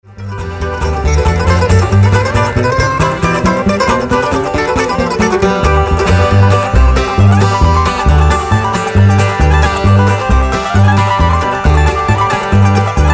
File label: Jenny Lind Polka